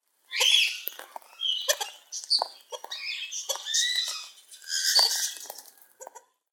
Livingstoneturako Tauraco livingstonii

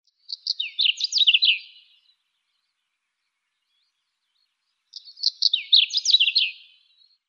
Schwarzkehlchen Saxicola rubicola; Vogelstimmen

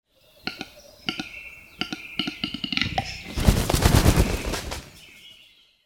Auerhuhn Tetrao urogallus; Vogelstimmen

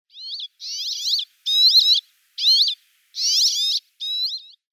Steinsperling Petronia petronia; Vogelstimmen

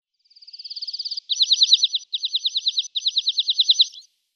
Rotkehlpieper Anthus cervinus

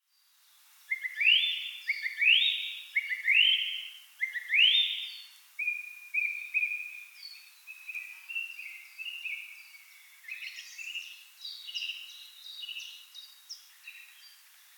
Singdrossel Turdus philomelos; Vogelstimmen

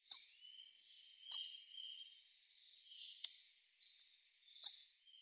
Kernbeisser Coccothraustes coccothraustes; Vogelstimmen

